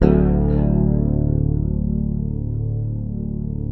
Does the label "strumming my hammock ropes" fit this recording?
No